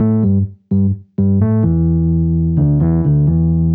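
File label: Jazz Keys Electric Piano 128 bpm Riff E; B-line n Riff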